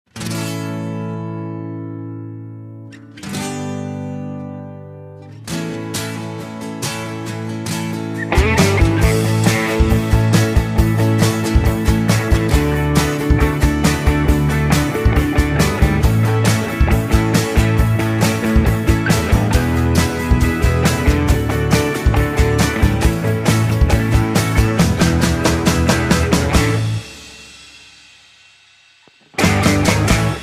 (A) No Backing Vocals

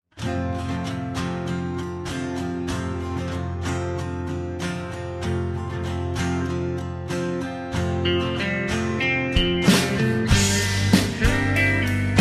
F No Backing Vocals

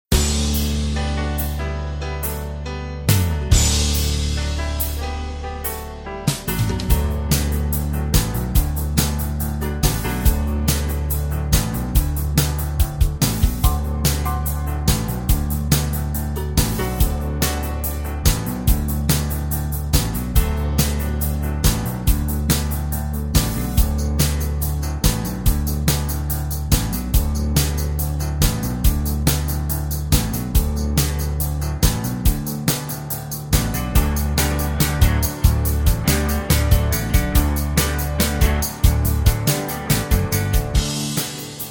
(G) No Backing Vocals

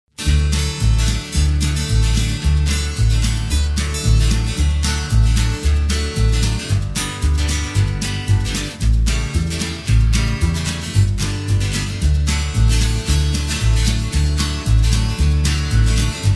(D) No Backing Vocals

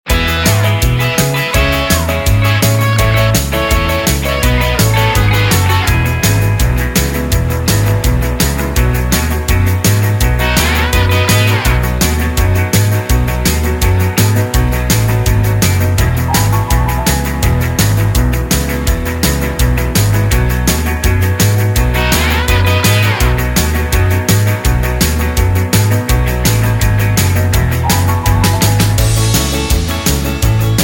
E To F No Backing Vocals

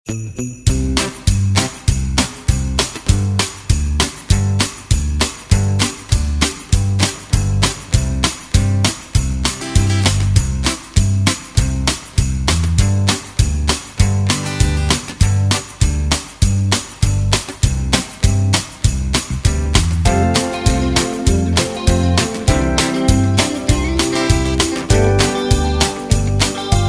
C To D No Backing Vocals

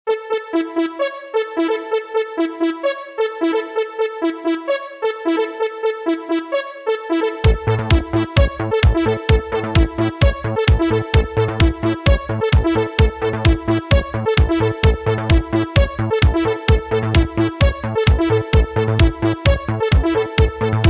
(A) No Backing Vocals

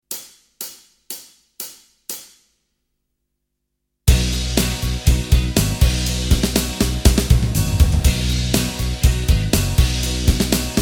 (B) No Lead Guitar

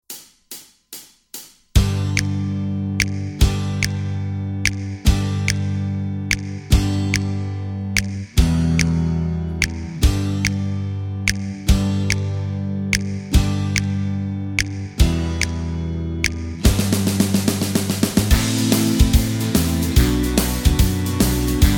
(G) No Guitar Solo

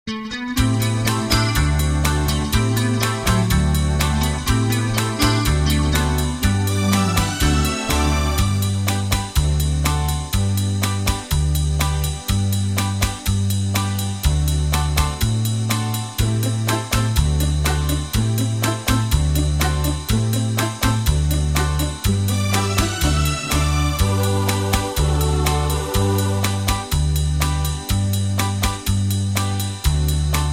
Footsteps (G)

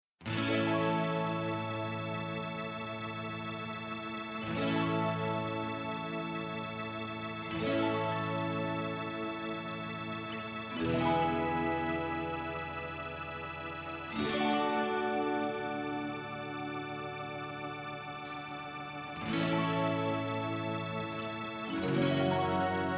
No Backing Vocals

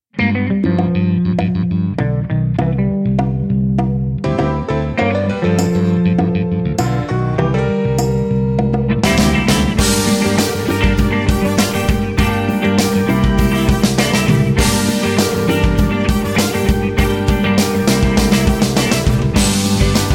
No Backing Vocals

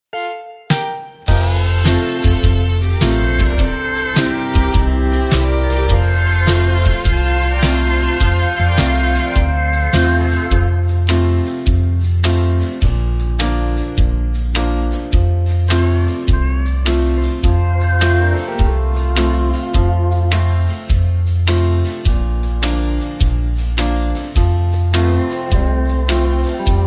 (F) No Backing Vocals

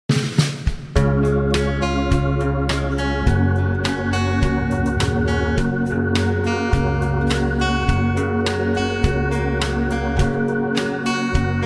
(Gb) No Backing Vocals

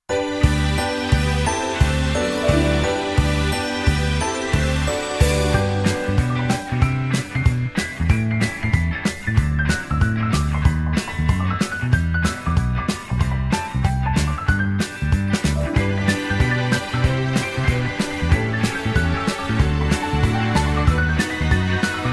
No Backing Vocals

